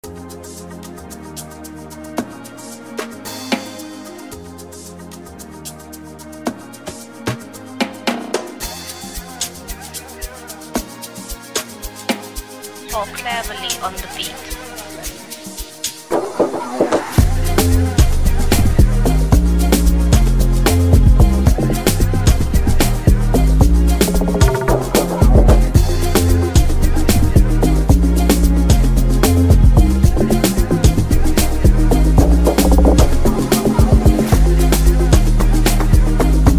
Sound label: Amapiano